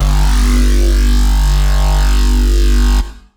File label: Bassline; Ghosthack